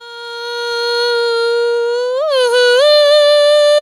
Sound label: vox; Zero G; Man Machine